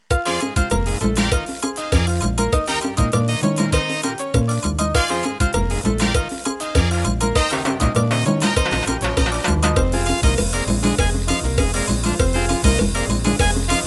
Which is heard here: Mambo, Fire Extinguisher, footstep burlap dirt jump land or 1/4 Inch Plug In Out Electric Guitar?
Mambo